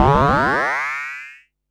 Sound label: Perc Synth Raw